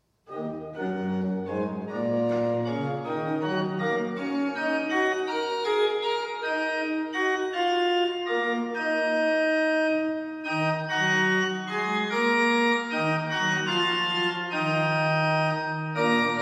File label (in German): O Heiland reiss die Himmel auf Orgel